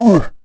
roar; The Elder Scrolls III Morrowind GOTY; XBOX

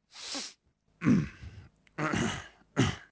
cough; Soldier Of Fortune II Double Helix; XBOX

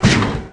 Mech Footstep; Tallan Mech; Aliens

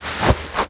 shoe on velcro tie; Tony Hawk's Project 8; XBOX; bedroom

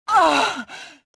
choke; mothma

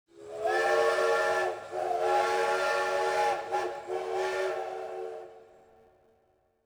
train toot; prague; Soldier Of Fortune II Double Helix; XBOX; ambience

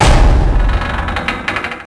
Archive Shelf Falls; asgard

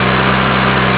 HIGH, Engine; Cars; LUIGI

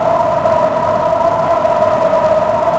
fast, ships engine; Quantum Redshift; XBOX; yuri

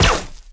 rico repeater Cannon; impacts explos

